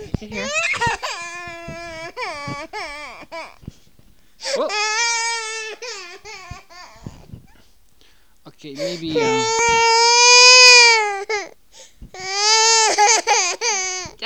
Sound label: crying